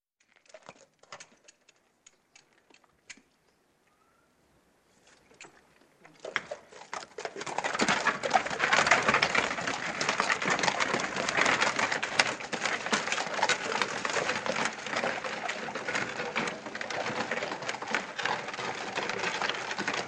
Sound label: Horse and cart; Working Life